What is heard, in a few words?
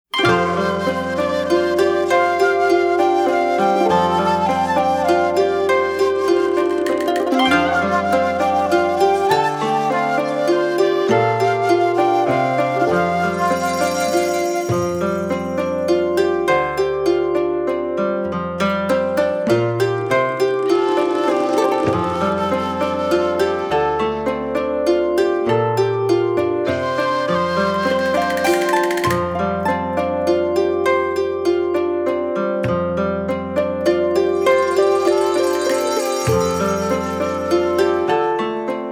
Arirang Accompaniment